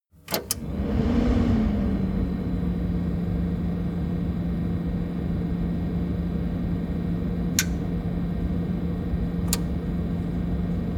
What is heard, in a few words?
stove extractor fan light